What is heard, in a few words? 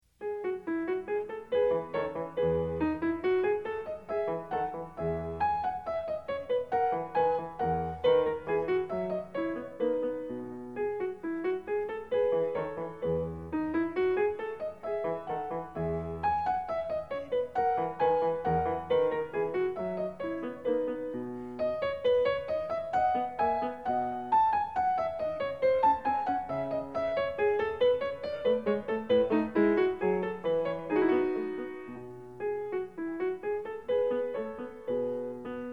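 menuett, piano; BACH; FRENCH SUITES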